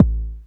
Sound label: Kick; Ableton Live 12 Lite Core Library